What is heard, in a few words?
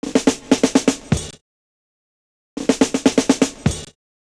SNR INTRO; Univers Sons